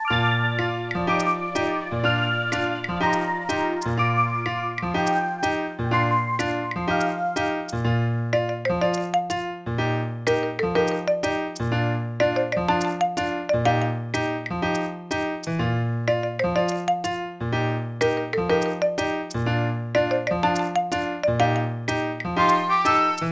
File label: BGM Opening; Machi no Pet-ya-san DS 2 Wan Nyan 333-hiki Daishuugou